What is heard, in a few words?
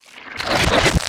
fleshy; svencoop; rustworld